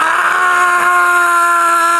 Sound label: scream; trickcal